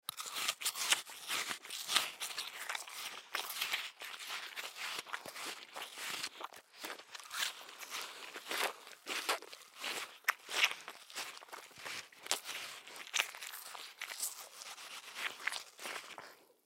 Human Bite Food; Bite Food Chew Apple Hard Eat; Eating; Blastwave

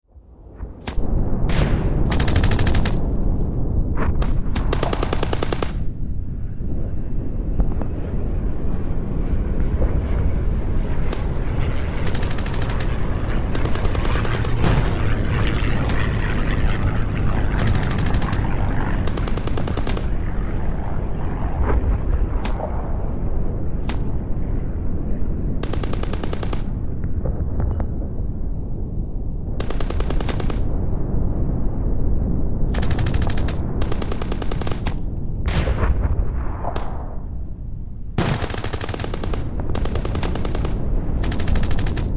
Warfare Battlefield Ambience World War Ii

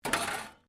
METAL SCRAPS MOVE

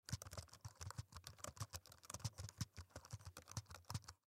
Laptop Type Long Computer; Sony Vaio; Computers (Sound Effects:Electronics); Blastwave; Technology

